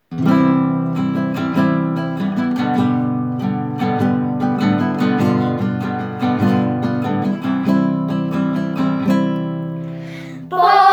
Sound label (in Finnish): laulu; SWE-Räfsö-Optarna